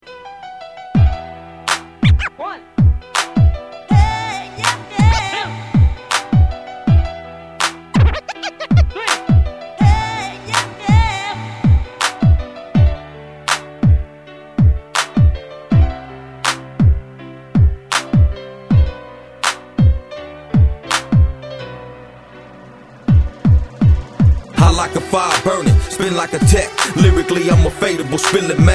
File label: rap